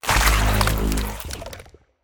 trail; smite; god cthulhu

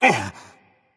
surprised berserker; cuchulainn

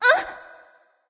nv surprise; smite; awilix

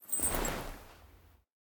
armor; smite; olorun

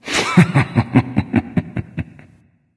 laugh; smite; voicepack; ullr

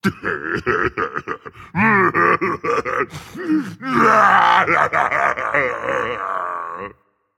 boohoo, dragon; fafnir

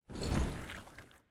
armor; Smite; god Khepri

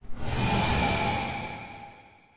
nightmare glide; Smite; Thanatos

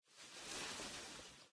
cloth long; smite; scylla

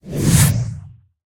coffin pull; Smite; Baron Samedi

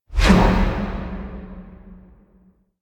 auto swing; smite; poseidon

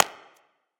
clap; smite; heimdallr

